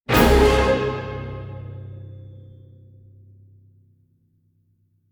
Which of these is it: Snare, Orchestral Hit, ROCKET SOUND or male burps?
Orchestral Hit